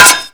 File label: knife metal; ModernWarfare